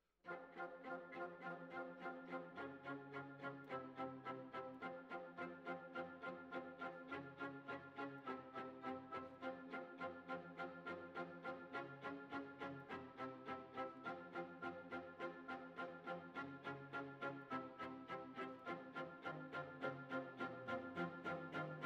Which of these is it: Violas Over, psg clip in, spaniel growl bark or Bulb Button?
Violas Over